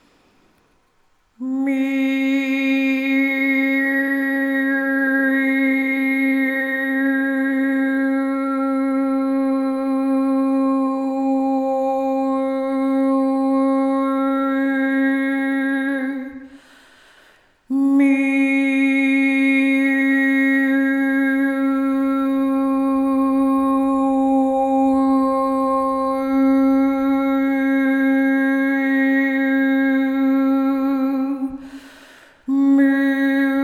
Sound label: overtone